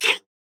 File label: Death; paladins; BombKing